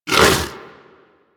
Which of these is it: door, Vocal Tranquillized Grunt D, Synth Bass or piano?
Vocal Tranquillized Grunt D